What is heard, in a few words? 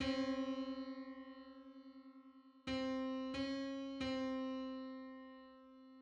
Hundred-thirty-third harmonic on C; MIDI